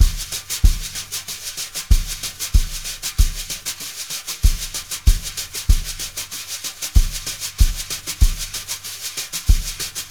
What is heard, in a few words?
BRUSH LOOPS, 95 BRUSHIN